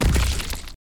blow, battle

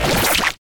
knife stab

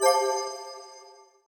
news title

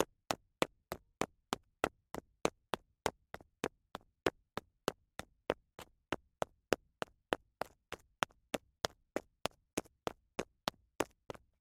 dash leather shoes

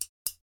chopsticks katikati

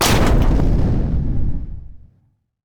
cannon

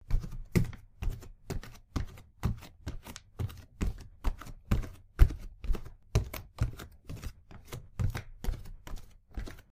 walk flooring

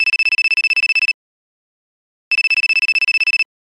mobile phone ringtone

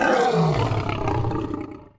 lion cry

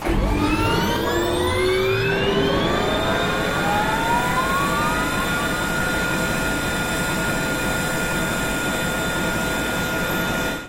giant motor

